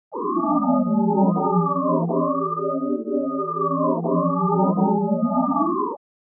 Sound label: line 2 sine